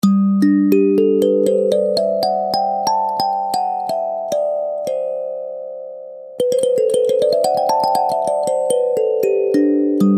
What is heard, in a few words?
Kalimba Melody